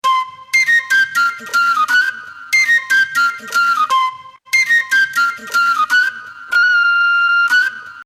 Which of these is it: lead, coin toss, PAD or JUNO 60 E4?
lead